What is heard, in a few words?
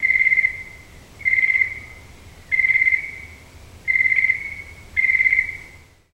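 Crickets Cicada Night Exterior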